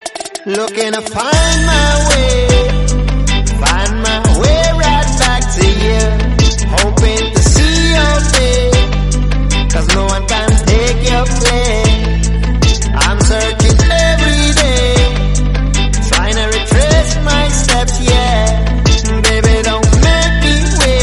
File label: romantic song tone ringtone